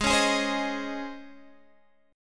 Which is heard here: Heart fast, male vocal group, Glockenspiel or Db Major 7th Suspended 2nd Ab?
Db Major 7th Suspended 2nd Ab